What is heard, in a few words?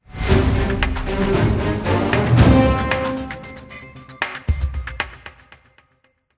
Faction Victory